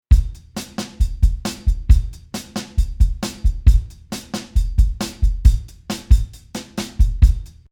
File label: Motown Drummer